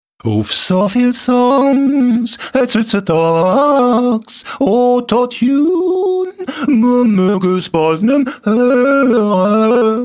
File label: Autotune